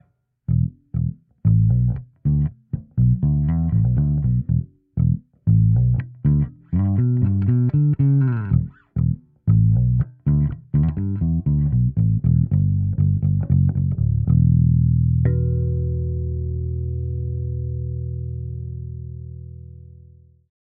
Nux Clean

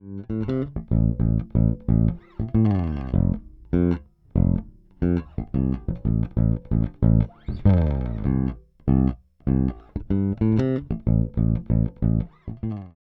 DI Tube; Zoom B2 Four

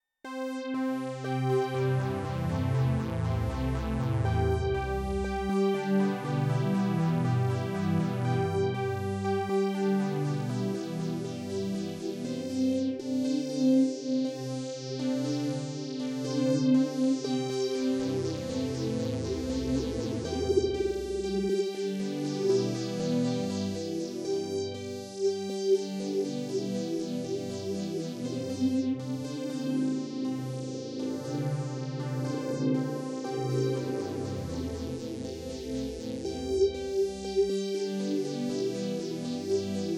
NTS-3 Synth Phaser

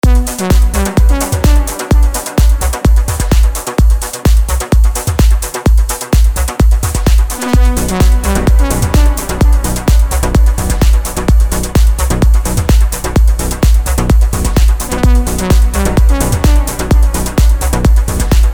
Avenger 2 Tiny Brasses; Vengeance Sound; Melodic Tech Voices 1

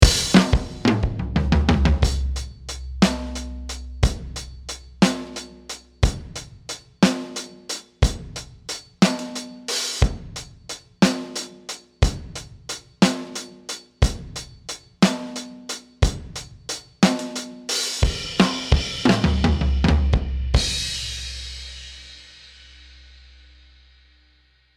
Superior Drummer 3 1960 Mono Kit

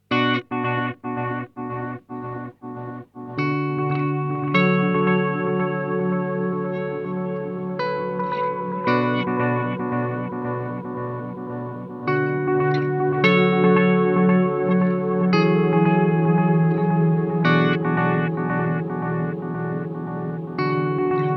Boss DM 101 Pan

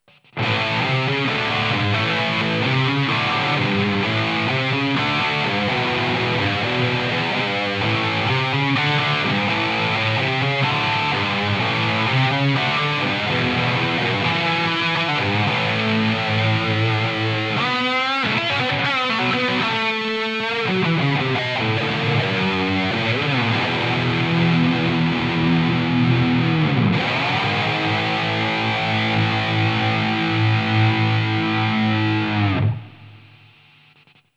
ToneX One Marshall JMP 100 Board; IK Multimedia

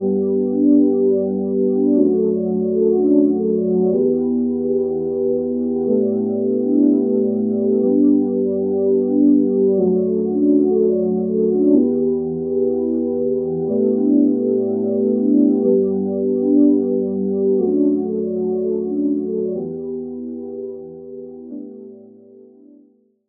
Chords; Song Deconstruction

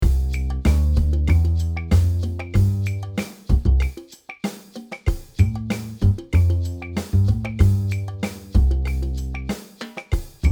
Mix Dry; EQP

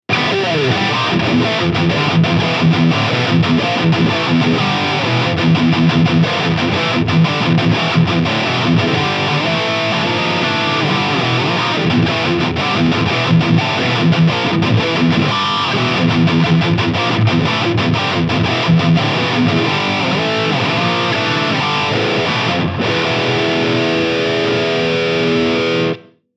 Solar Guitars High Gain; X1.6 Canibalismo

